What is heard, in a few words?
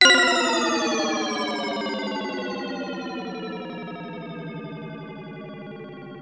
digi sweep